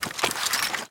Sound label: blasters deathray foley undeploy; reload